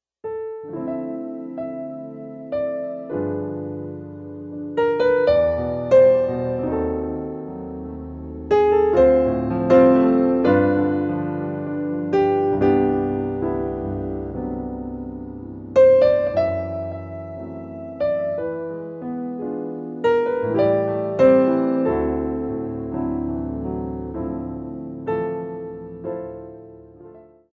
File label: Naked Piano; Christmas